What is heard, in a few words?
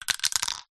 vehicles bicycle plastic wheel reflector fall off